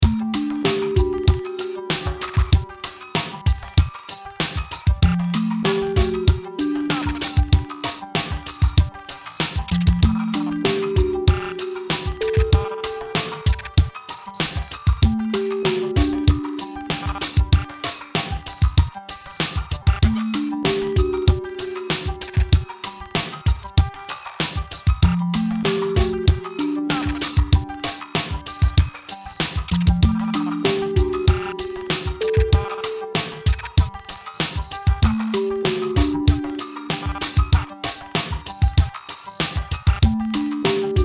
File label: beat Pattern; johnny square sampler